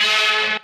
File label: Horns